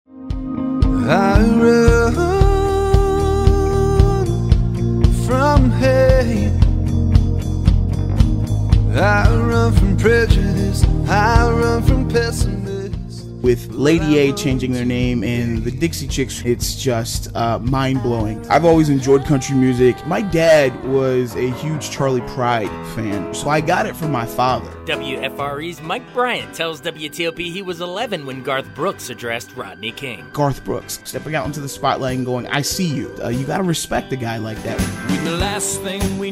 country music

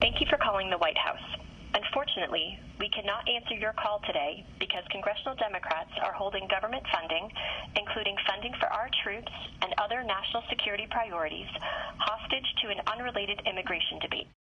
white house phone

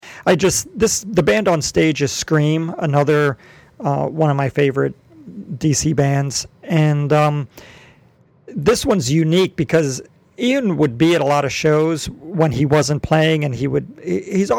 Scream

